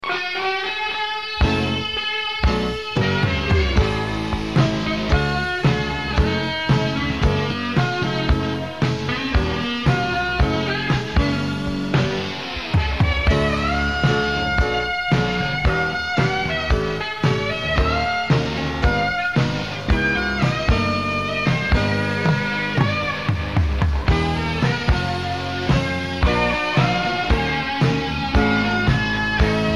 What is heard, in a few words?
Rock Instrumental; WCCO AM